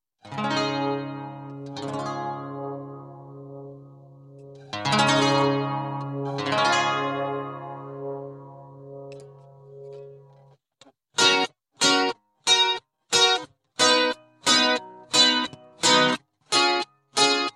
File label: young love acoustic guitar